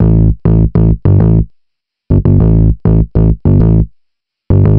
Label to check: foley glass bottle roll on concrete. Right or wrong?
Wrong